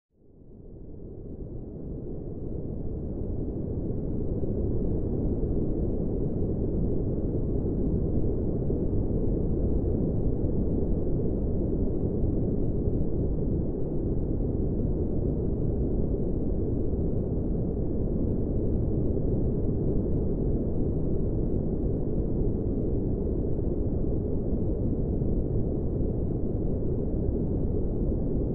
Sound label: pink noise deep